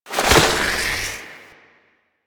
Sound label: Cover Cast; Warframe; Quests; The New War; Kahl; Abilities